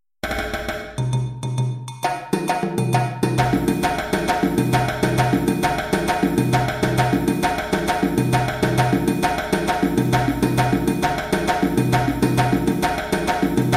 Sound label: Arrangement 200 bpm, djembe; Kadan